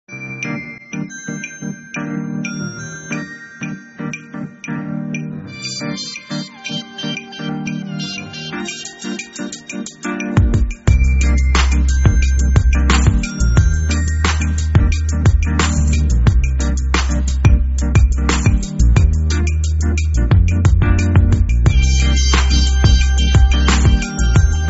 Nokia, Ringtone

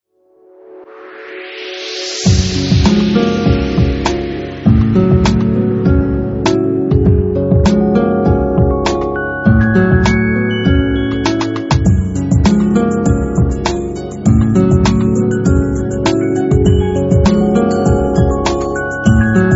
Nokia Asha Pebble, ringtone

